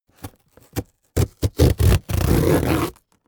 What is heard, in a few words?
cardboard box small rip open; objekte